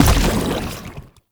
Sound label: Step Default, Foley Footsteps; Enemies; Warframe; Lasrian Soldiers; Lasrian Chem Strike Nox